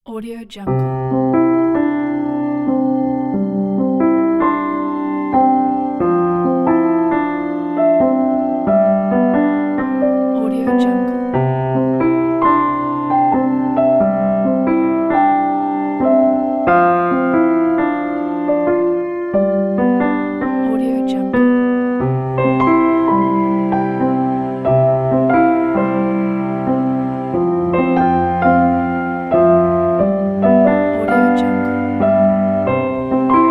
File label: calm piano